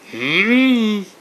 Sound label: tape face mmmm